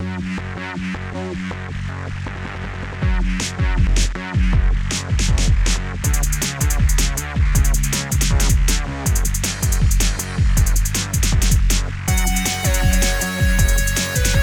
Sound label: Action Theme